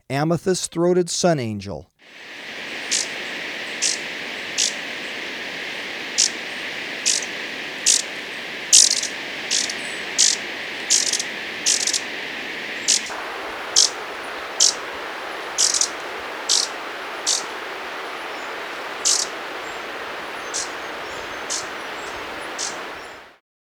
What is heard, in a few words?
Amethyst-Throated Sunangel; S. America